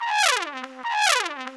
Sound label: TRUMPE, Trumpet FX; Roland - LA Composer vol. 2